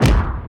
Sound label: Impact; Ed Edd n Eddy; Adromulus Pack; EEnE